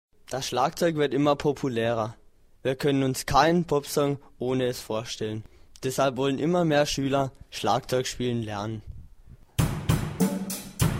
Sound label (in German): Schlagzeug